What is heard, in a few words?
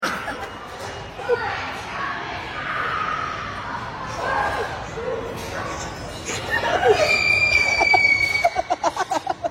bathroom poopoo iiiiiii